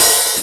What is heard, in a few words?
OP HHAT, EMU PX-7 Drum Machines